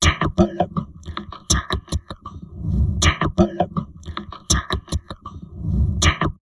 TALK, Drum Loops Crazy Processed

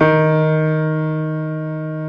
D2, GRAND PIANO; InVision Interactive; Lightware VOL-1; Instruments & Percussions

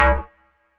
DM BAS, Dance Mega Synth; Best Service

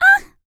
Vocal, R&B & Dance, FEM. HUMAN

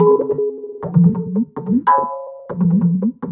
TABLAS; Zero-G; Chemical Beats

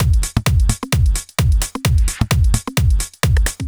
With Hat, Drum Loops 130bpm; Zero-G; Soundclash Nu Dance Electro; Acidized Wavs; Hard Mean

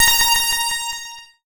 A#, Synclav FM; Big Fish Audio; SPACE1

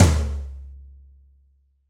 Room Tom 1 alt, Roland BK-5 Toms

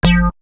Shot; AMG

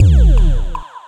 Sci fi

